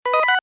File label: btn sound click